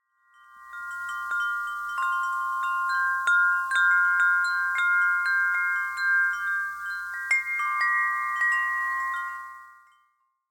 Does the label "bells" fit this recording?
Yes